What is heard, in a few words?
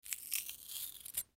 Potato Remove Knife Slow; Food Sound Effects; Household; Foley